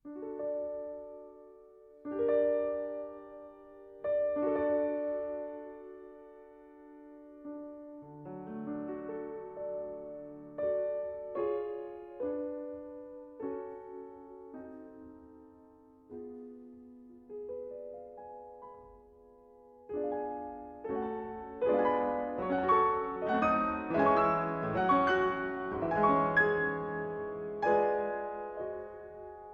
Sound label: sacred piano